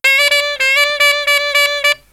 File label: SAX, Killer Brass Riffs; USB Soundscan vol.29 - Killer Brass Riffs; AKAI